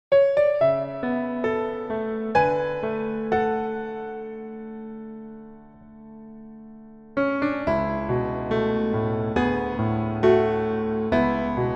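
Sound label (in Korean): Piano version 남자키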